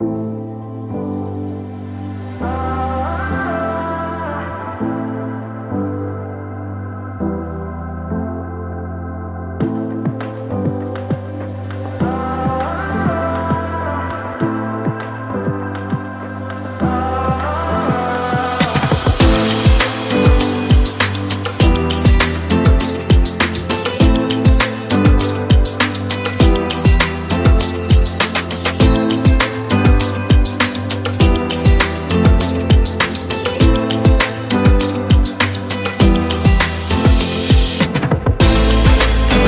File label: Mobile Ringtone